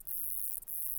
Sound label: song, katydids; neduba cascadia